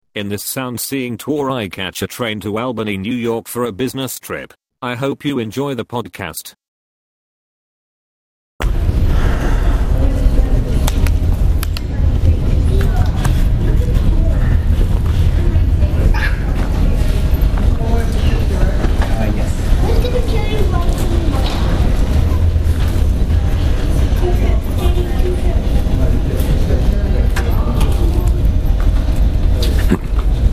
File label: Albany Train Ride